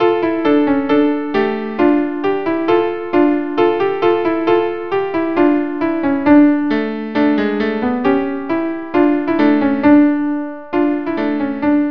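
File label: violin violin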